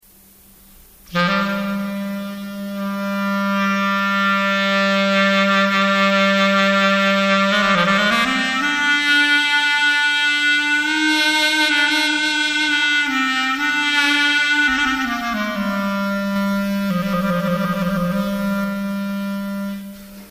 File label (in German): Klarinette